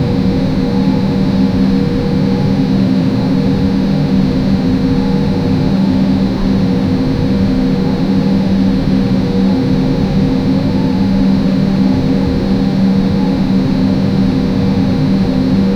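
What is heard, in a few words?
indoor school hallway ambience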